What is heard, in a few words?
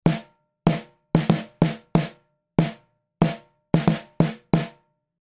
Snare Beyer201 HF 8to1 100ms Release Drive